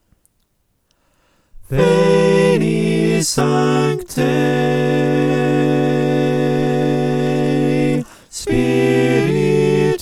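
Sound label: soprano alto tenor bass, choir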